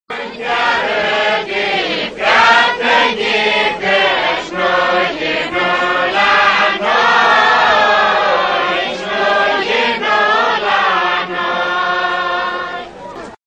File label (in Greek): χορωδία παραδοσιακή; Αετομηλίτσα